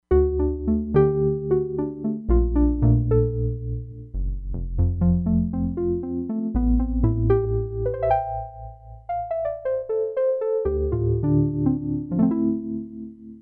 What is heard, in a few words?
ELECTRIC PIANO, Crumar Bit 99; Factory Programs